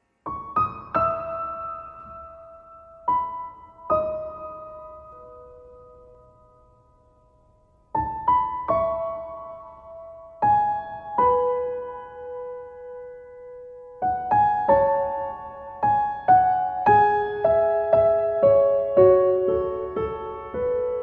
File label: Piano Only